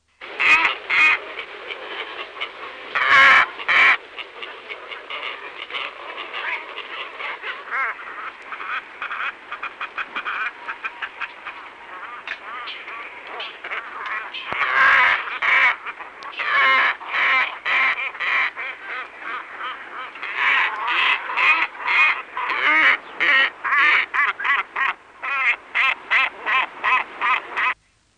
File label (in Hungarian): Batla; Madarak